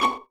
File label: vipiz C#5, Violins pizzicato